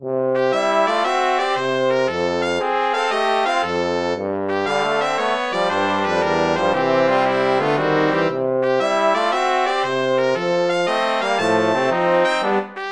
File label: brass